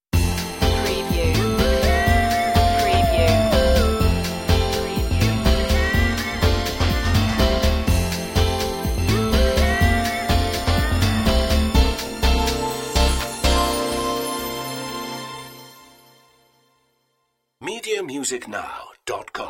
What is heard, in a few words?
vintage beats stinger